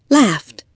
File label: laughed